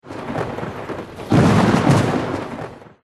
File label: Thunder; Hell Yeah! Wrath of the Dead Rabbit